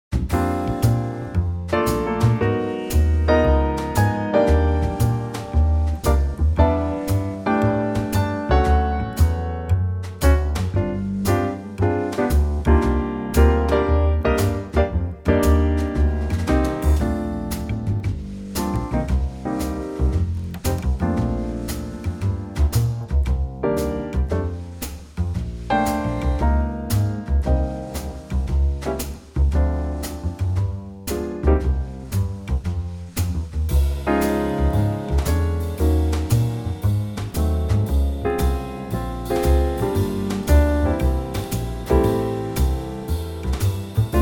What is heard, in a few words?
Trio; Bb Trio; key - Bb - vocal range - Eb to Ab